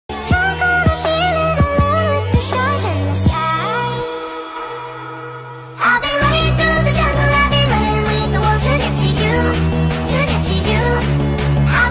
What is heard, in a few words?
speedup, english ringtone